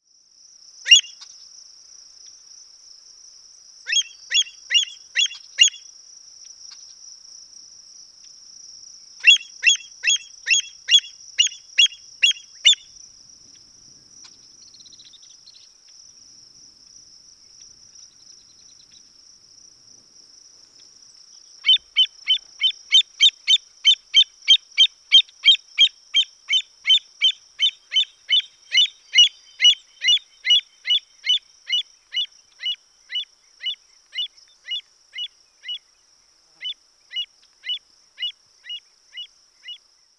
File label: Avocet Western Calls